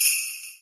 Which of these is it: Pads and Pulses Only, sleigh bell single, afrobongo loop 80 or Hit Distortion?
sleigh bell single